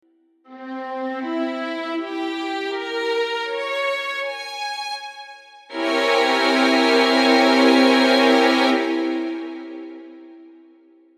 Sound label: chord 7b13